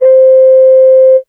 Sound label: Casio CTK-611 Ocarina C5